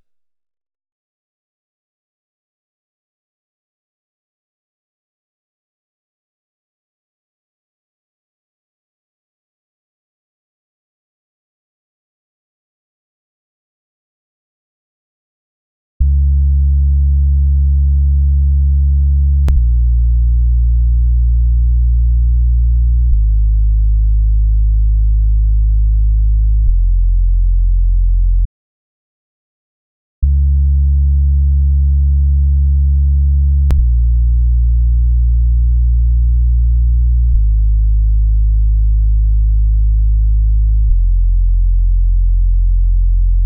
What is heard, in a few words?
racing bass